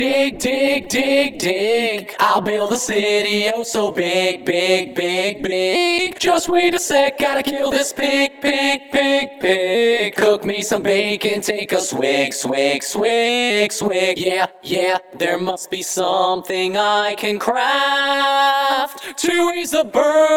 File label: Vocals